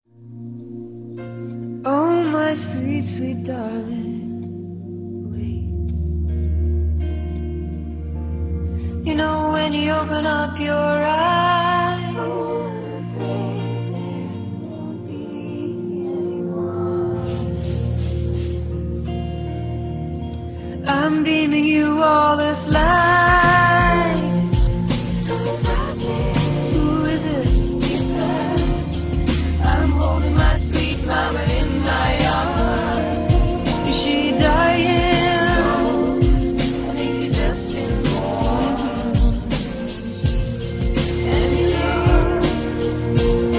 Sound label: gospel